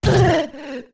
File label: Fall Down; Zora Link